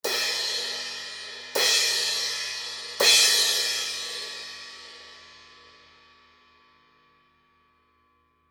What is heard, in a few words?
zildjian medium crash hits